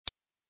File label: soft click button